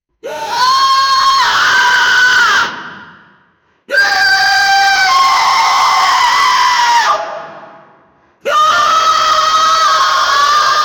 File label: scream underground, screams; sound effect